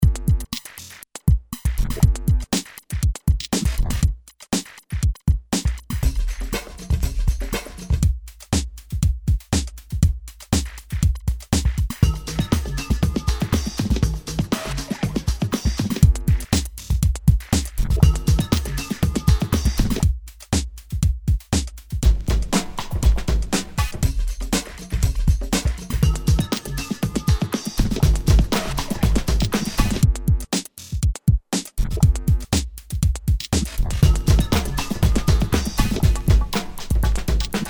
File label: Creepy beat; Reminiscent of old horror tracks